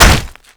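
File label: impact stone low thud; Explosions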